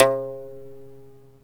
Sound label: GAMBIAN BOX; GAMBIAN BX; Heart of Africa